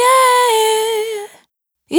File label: Urban Dry, Single Voice Melodic Loops; Pocket Diva World Class Vocal Samples